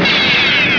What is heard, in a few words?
Laser; BSG FX - Viper Laser 01